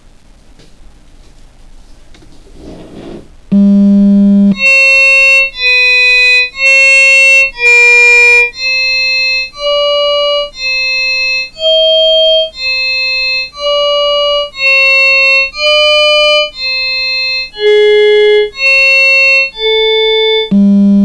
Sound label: clarinet